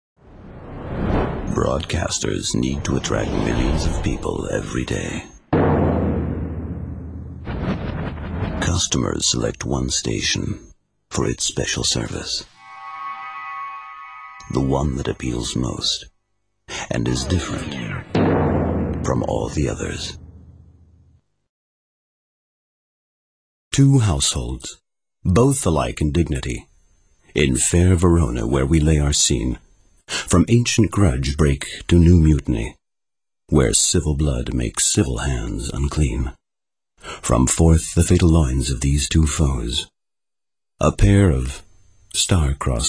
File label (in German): werbung lyric deutsch gesang